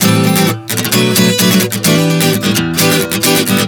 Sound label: Em7-Am7-Bm7 130 bpm, acoustic guitar chord progressions